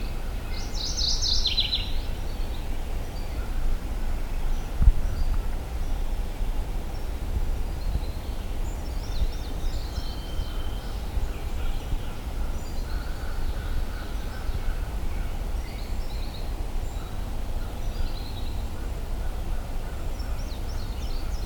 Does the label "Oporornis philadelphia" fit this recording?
Yes